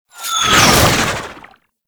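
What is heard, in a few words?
Pistol Alt Fire Projectile By; Warframe; Scaldra Pistol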